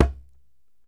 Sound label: DJEMBE, African Drum